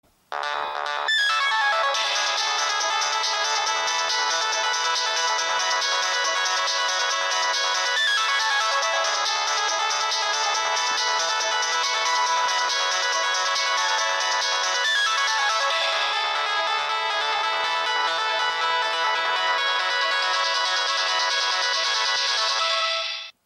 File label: nokia 6020 famous ringtone